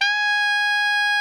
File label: tenor saxophone FFF G#5; Greytsounds - Philip Wolfe David Crozier's Saxophones; TENOR SWITCH